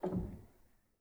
rel, Grand; bitKlavier; Binaural

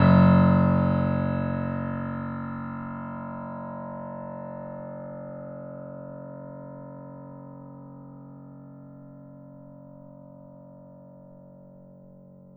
F#1, bitKlavier Grand Binaural

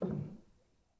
rel, Grand

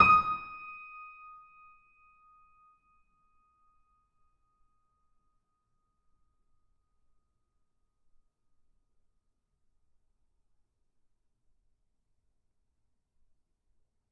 D#6, bitKlavierGrand Binaural

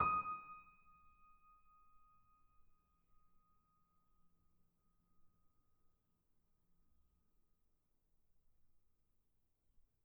D#6, bitKlavierGrand Binaural

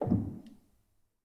rel, Grand

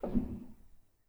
rel, Grand

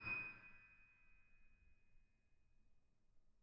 harm D#7, Grand

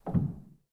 rel, Grand; bitKlavier; Binaural

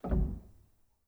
rel, Grand

